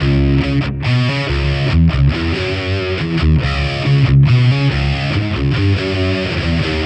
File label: Distorted Guitar 140BPM C#min; Distorted Guitars; Vengeance - Essential Clubsounds Vol.4; Guitarloops